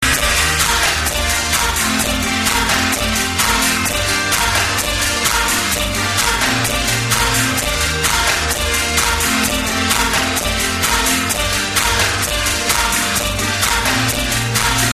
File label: Rock